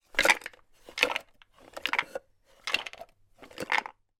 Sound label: toy puzzle jigsaw in wooden box movement tip